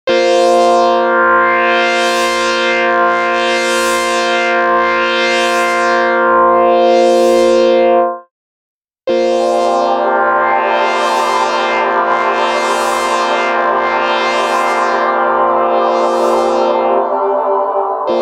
Saw Pad Build Up; Dawesome LOVE 1.0.1

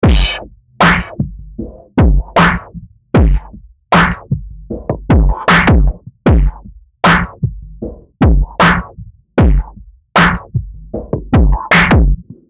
Drumgroove Drums Cool

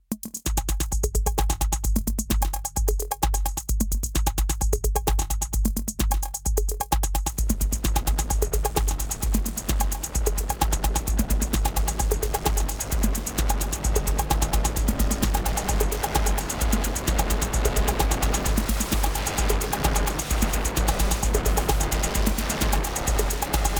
Erica Synths Black Hole DSP2 Hellraiser Delay

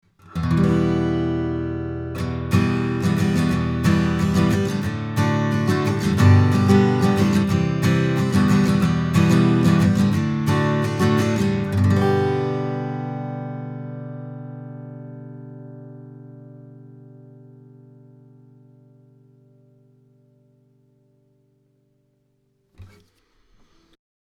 U67 Fig8 Ac Git Chords A; RME UFX

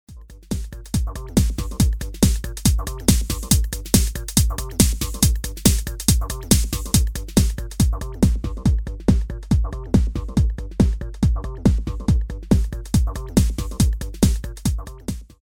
hi boost cut

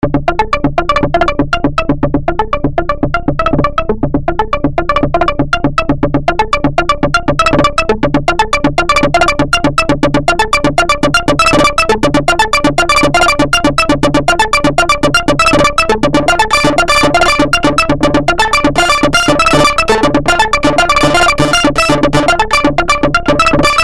Subsequent 37 Percussive Sequence

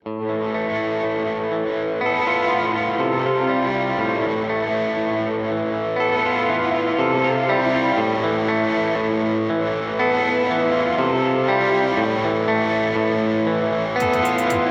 Rainger FX Reverb X

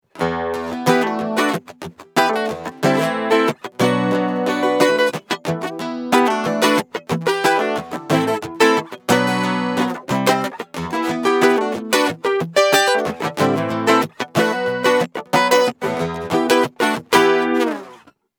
Chorus; LAVA Music ME-4 Carbon Preset

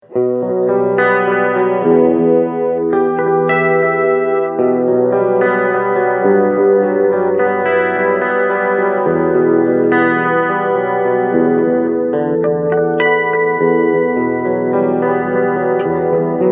Clean Chorus Delay

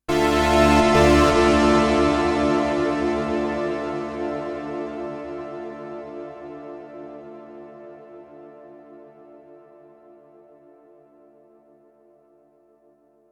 Ambient Chorus Settings

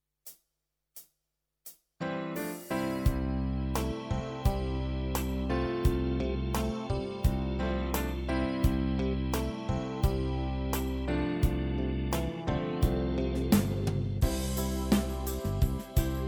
DGX650 Style 3

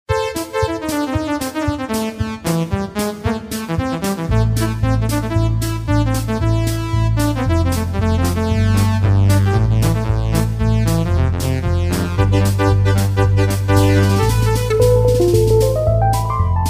Synth plus Drum

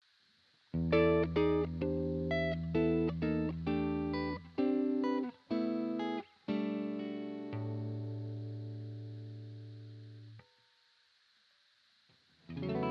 EHX 5150 Clean L3 DI Out

